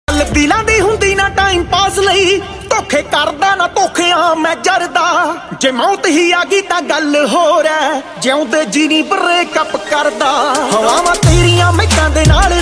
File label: punjabi song